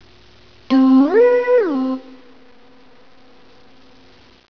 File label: bend